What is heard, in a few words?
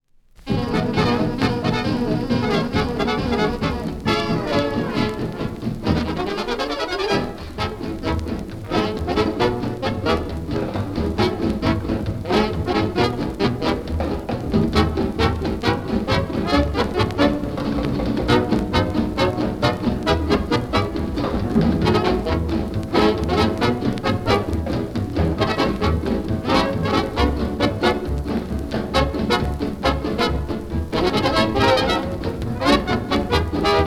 zvuki dzhaza, dzhaz orkestr